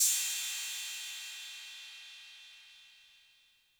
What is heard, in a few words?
Cym A 808 Tape Decay C; Cymbal; Clean